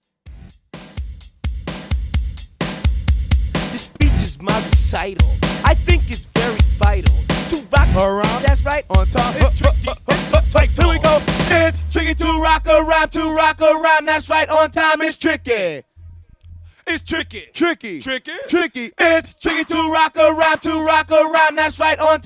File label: Epic Intro 128 Bpm